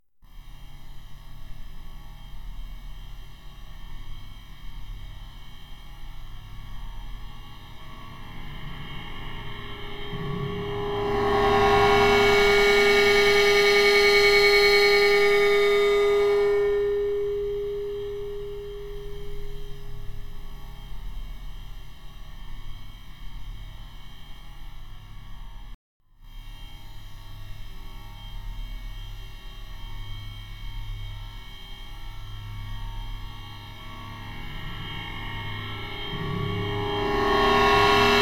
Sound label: Guitar Feedback Reverbed Pseudostereo; Disturbed3112